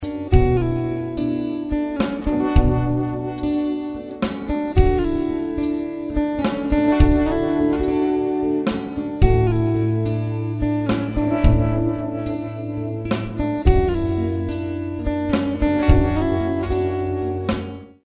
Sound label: acoustic ballad; assoli e improvvisazioni varie